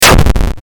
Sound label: explosion, sfxr